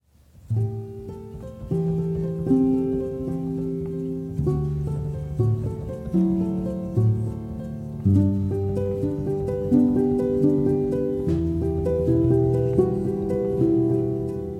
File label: piano music; PIANO DAY